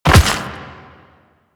Step Dirt, Footsteps

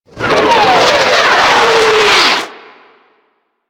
Mortar Incoming A; Warframe; Ambience; Eidolon Plains; Grineer Emplacements; Rocket Swarm Launcher

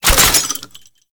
Scaldra Pistol Reload

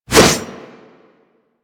Pole Arm Whoosh; Warframe

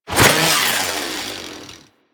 Hand Saw Whoosh

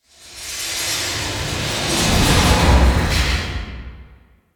Town Large Moving Object, Ambience; Warframe; Duviri

